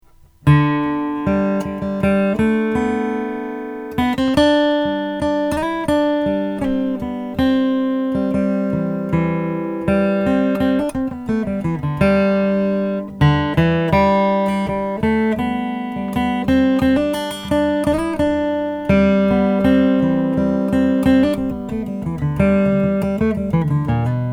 decava mammoth flattop maple

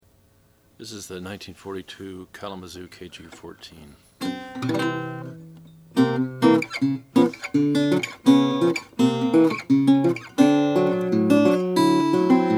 gibson kalamazoo kg 12 brown sunburst

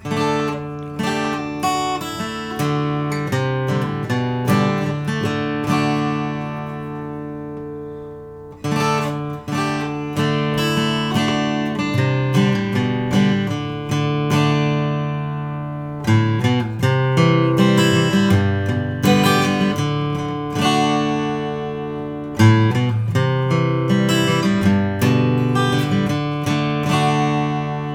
froggy bottom h 14 deluxe rosewood

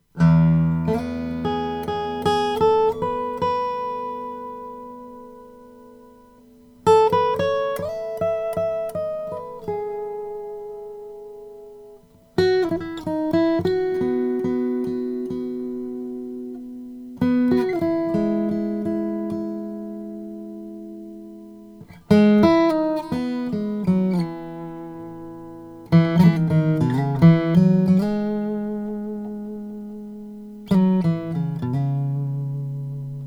mcpherson mg 4 5 ziricote; 2008

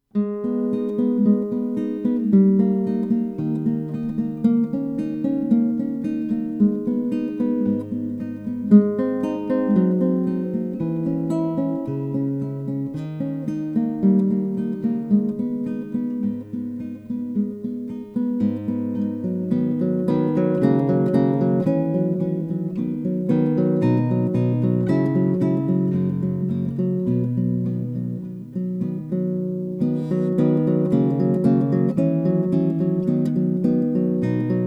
classical birdseye maple; somogyi

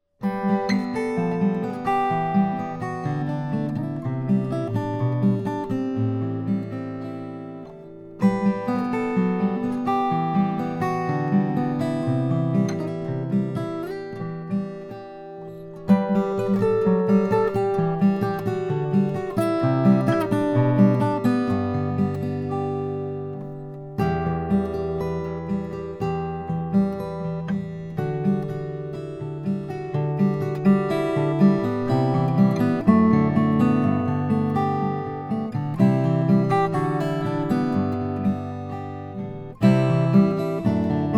bourgeois custom 0 madagascar rosewood

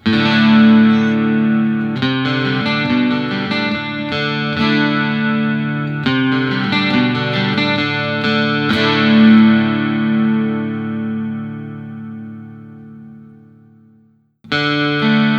tom anderson crowdster plus desert

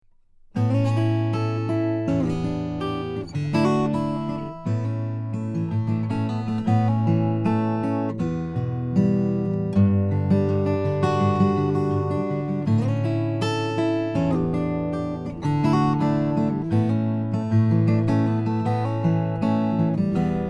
olson sj cutaway cedar top; 1993

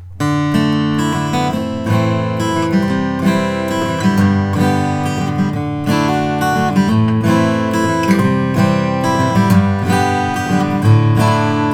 applegate small jumbo; natural

